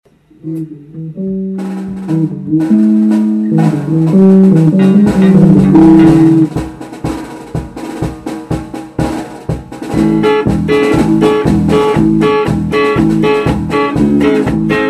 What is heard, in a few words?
instrumental